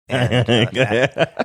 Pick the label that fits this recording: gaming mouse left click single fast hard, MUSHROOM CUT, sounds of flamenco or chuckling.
chuckling